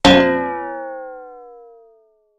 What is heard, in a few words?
Comical Metal Gong; 1001 Sound Effects